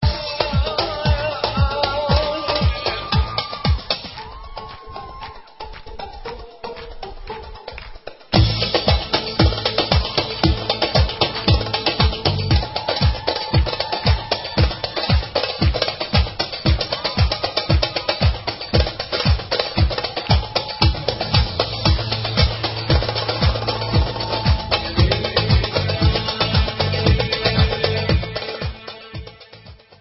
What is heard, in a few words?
Debka